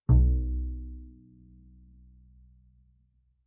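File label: Bass pizzicato fortissimo sul A B2, Double Bass; MIS Pitches - 2014; Strings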